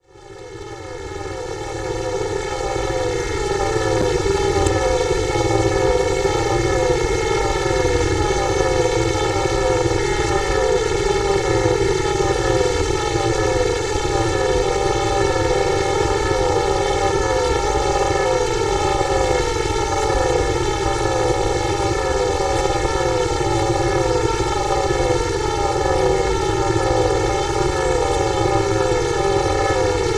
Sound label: STRANGE ATTRACTOR, DEEP ATMOSPHERICS; Sonic Foundry (Sony Creative Software); Vir Unis Infusion